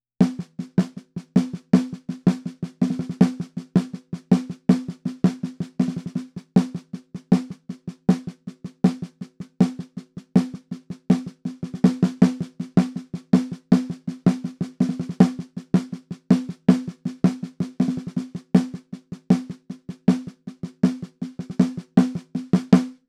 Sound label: perc bridge snare